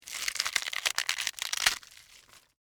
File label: Tear Crunch Cabbage, Texture, Tear, Crunch, Cabbage, Leaf, Vegetable; Ripping; BlastWave